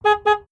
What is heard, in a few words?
claxon